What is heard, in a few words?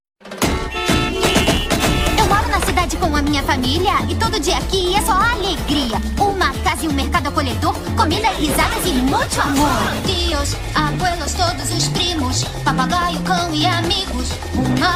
theme song (Brazilian Portuguese); The Casagrandes